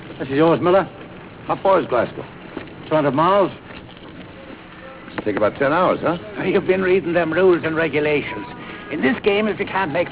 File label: truck